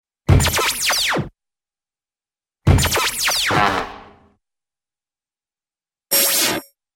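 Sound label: Drum Hit with synthy, Beep-like Zaps - Laser Zap; Hollywood Edge Cartoon Trax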